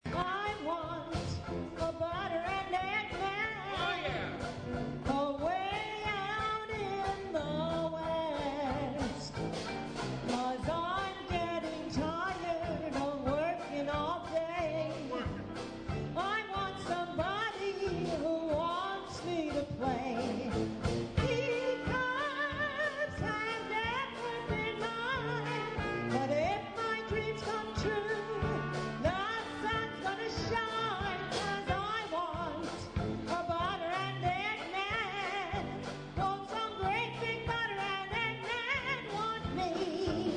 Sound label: Dixieland